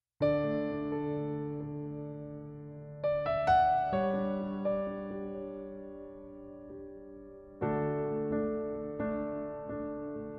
agnello di dio base

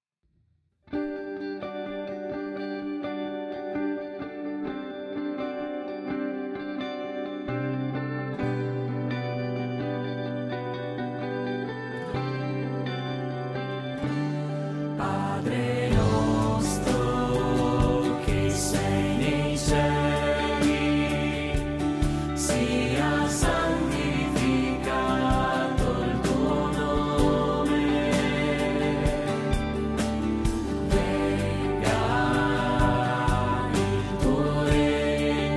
padre nostro non abbandonarci piano

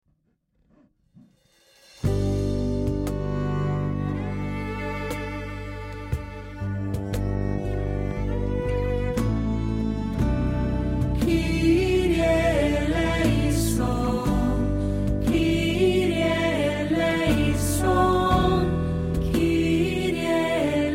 kyrie eleison voce coro

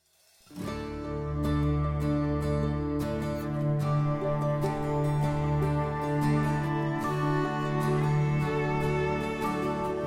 davanti a te voce contralti

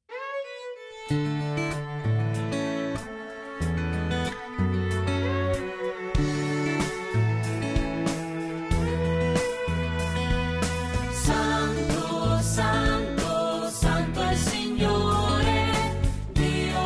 santo e il signore tastiere

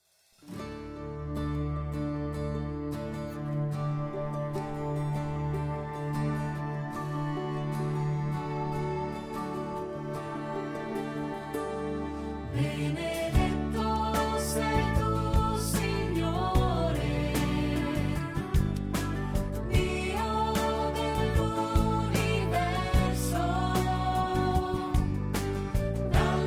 davanti a te o dio violino

